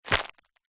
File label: plastic thick packaging grab; zapsplat; foley